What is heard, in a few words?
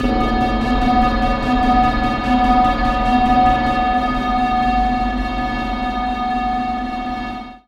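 Reverb Processed, alarm siren samples 125bpm; musicradar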